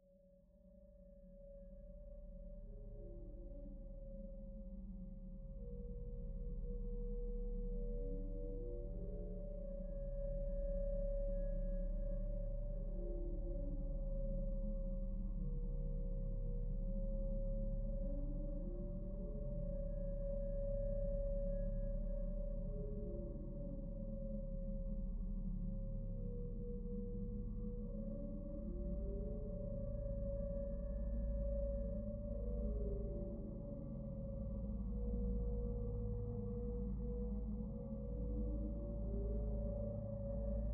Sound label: Ambience